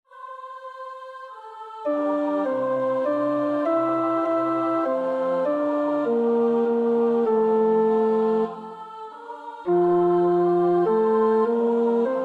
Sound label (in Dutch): Psalm 116 (Koor) (tenor); Oefenbestanden